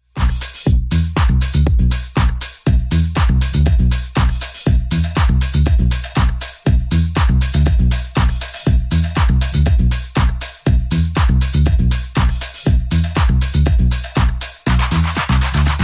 drums

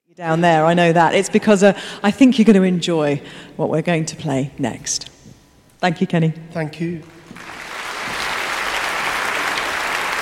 for 2 violins viola bass and 2 horns; Wolfgang Amadeus Mozart; Ein musikalischer Spass K522

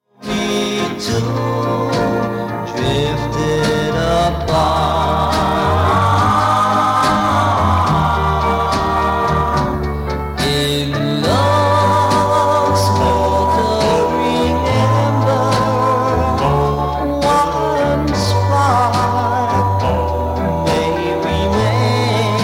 chimes

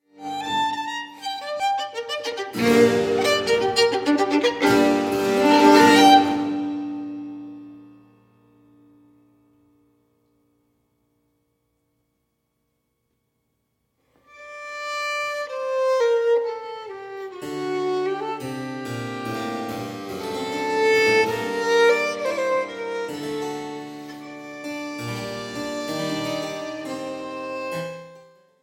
solo for violin and harpsichord; robert mackintosh

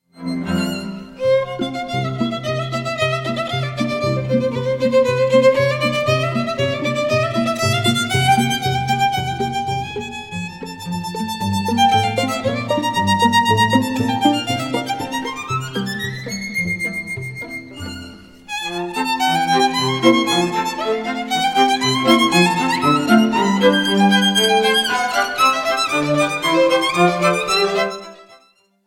sonata for violin and piano quintet version; ludwig van beethoven; op 47 in a major kreutzer

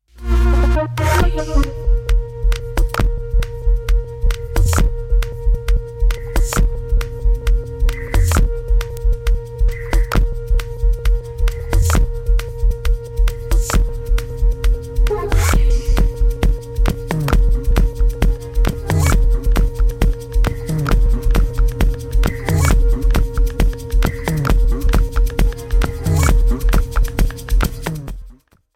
colder instrumental bass mix

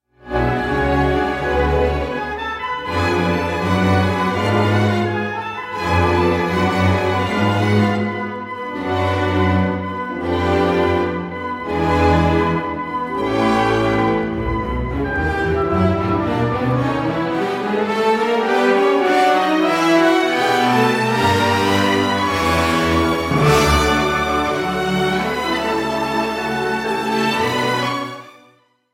symphony no 5 in e minor; pyotr ilyich tchaikovsky; bbc scottish symphony orchestra; op 64

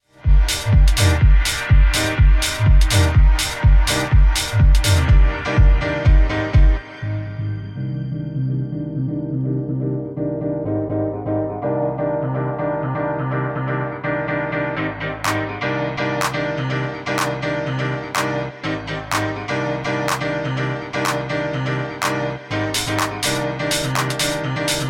piano weapon instrumental

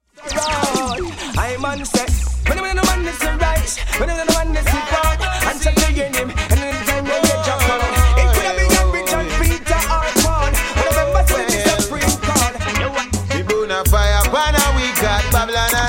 reggae music

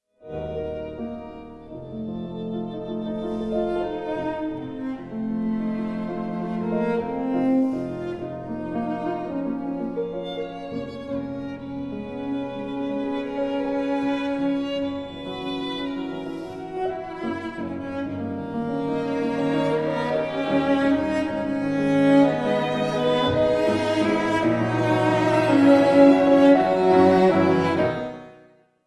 piano trio in c minor; felix mendelssohn; op 66

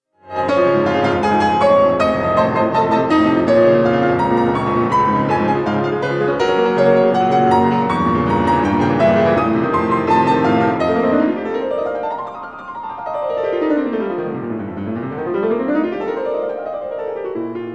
piano; Ludwig van Beethoven; rondo a capriccio in G major op 129; rage over a lost penny

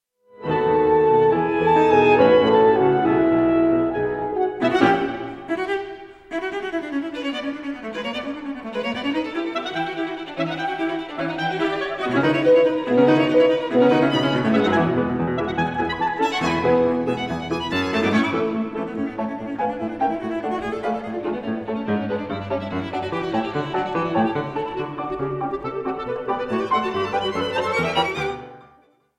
quintet for clarinet horn violin cello and piano; ralph vaughan williams; 4th movement finale

